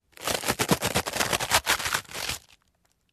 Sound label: Cutting Bread